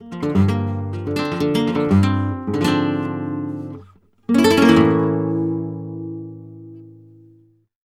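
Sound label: arpeggio guitar, Flamenco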